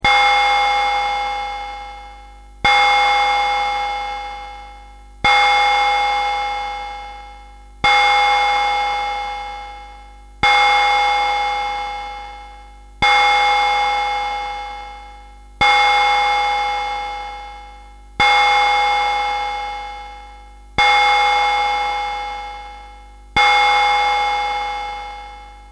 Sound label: bell